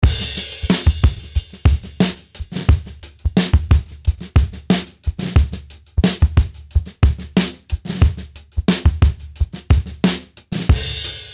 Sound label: Dirty Acoustic Beats